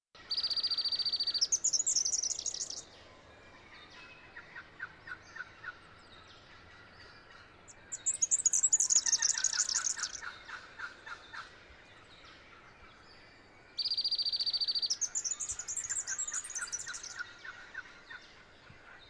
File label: fairy wrens